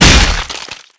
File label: Action Dead Impact